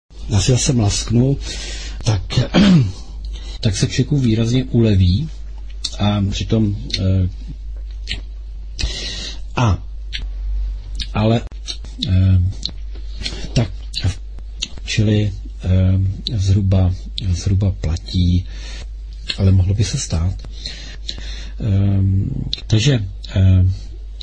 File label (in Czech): MLASKOT